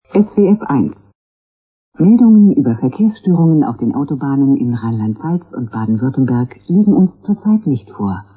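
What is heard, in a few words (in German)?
verkehr, Radiojingles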